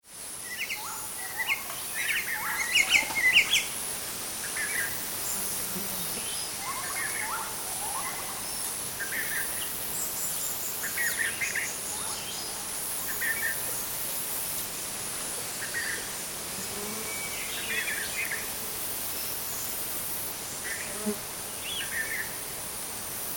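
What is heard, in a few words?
Tropical Birds and Insects - various bird calls; insects fly past - some foliage movement; Jungle; Tom Hutchings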